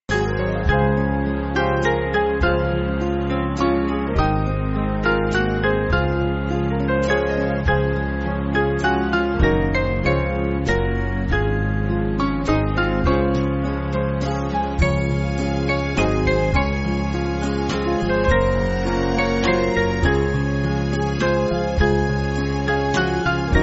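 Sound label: Band, Backing Files for Churches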